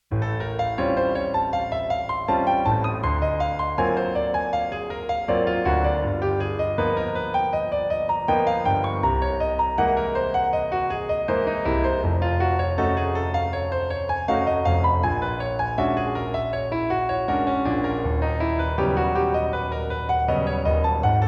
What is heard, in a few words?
Altered Scale Study; Jazz Piano